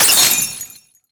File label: Ice Break; SE Ing Other Rule#10 (SE Ice01 Break)